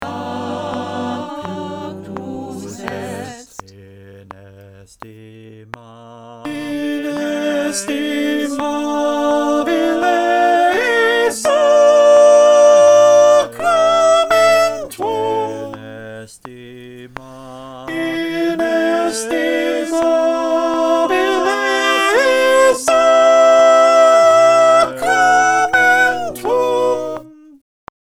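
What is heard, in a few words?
soprano click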